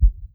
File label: Heart; In the Line of Duty Firefighter